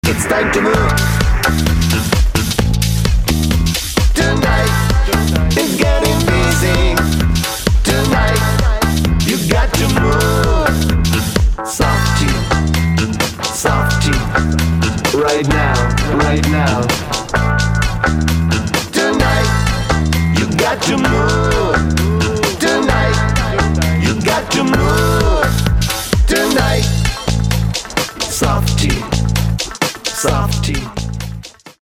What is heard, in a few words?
Latin Band; Miami Latin Band